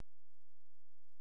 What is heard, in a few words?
insert shell